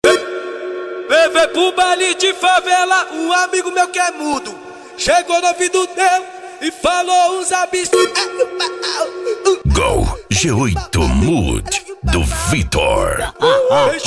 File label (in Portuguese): funk ritmado